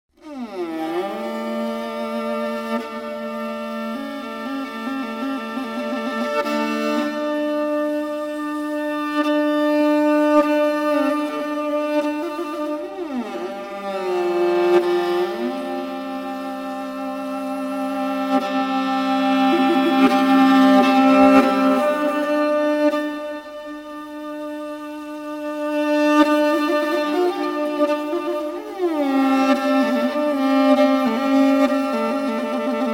matouqin

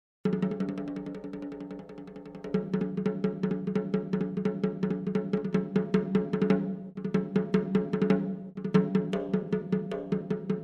jingtanggu

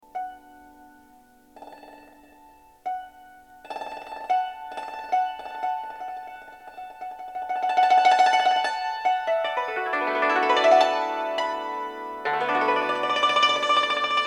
yangqin

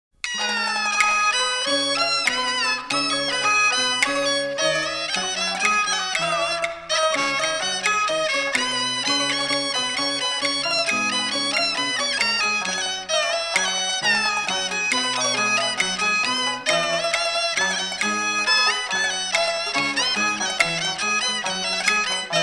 jinghu

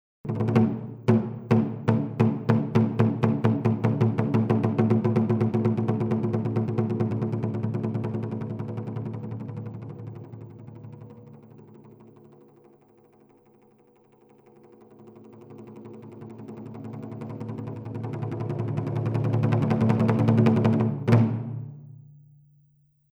huapengu

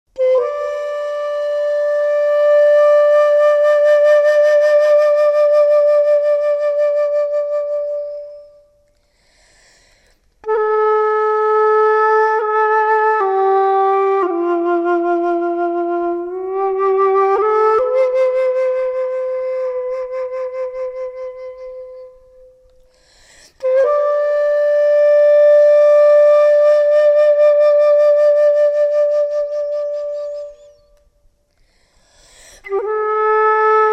xiao

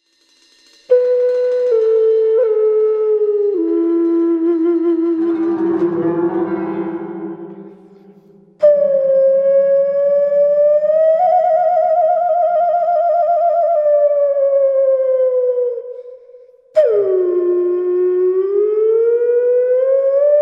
xun

